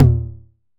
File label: Tom 909 Mid Hi; Drums